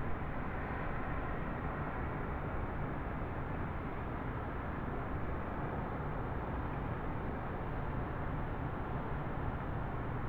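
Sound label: City Ambience; truck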